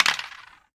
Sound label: Coin; Neko Atsume